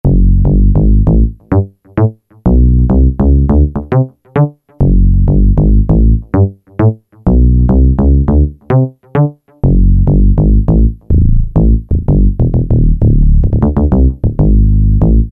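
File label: bass Syn, Yamaha TG500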